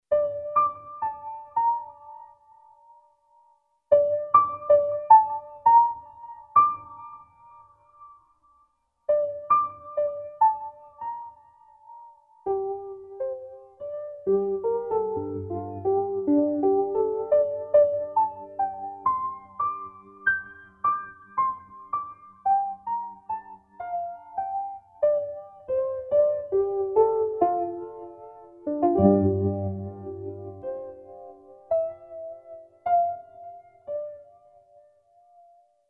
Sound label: Piano; Spectralis